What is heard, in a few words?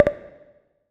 editable text, ChromeVox Earcons Reverb